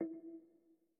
Move E 3 Mp; Mixolumia

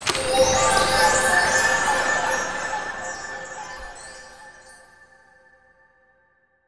UI GET ALBUM COMPLETE

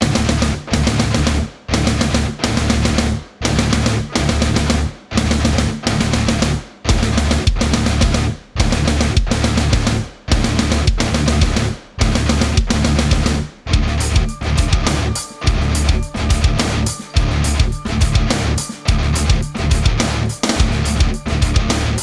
Timer; Call of Duty: Black Ops III

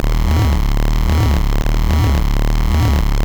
death sequence bubble

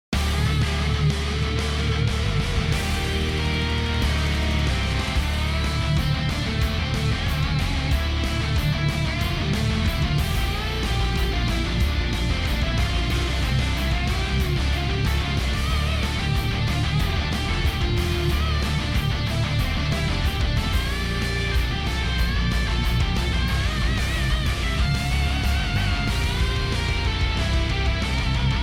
Battle Raging Rumble; undead world hero survival mobile gamerip 2021

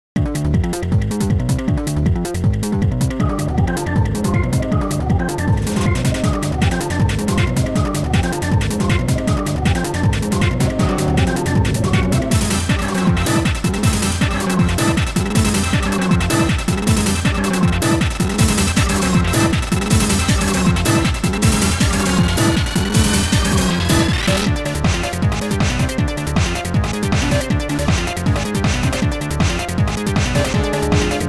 Score Attack - Hurry Up

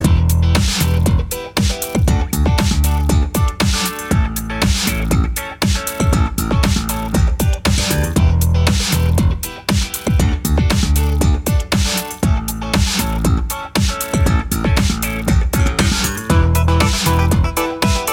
Slap And Funk; tiny hands adventure ps4 switch windows xbox one gamerip 2018

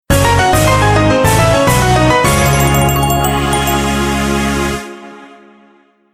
Fx Ui Success; demong hunter 3; android

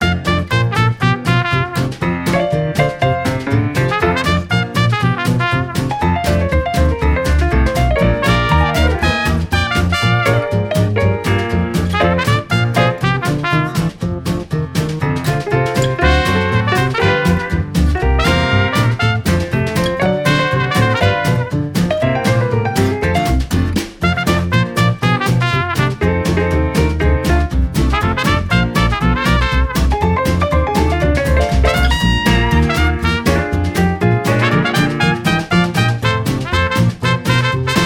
Diner Beignet; the princess and the frog; Frog Music

